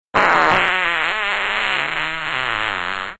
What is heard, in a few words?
fart screaming hurricane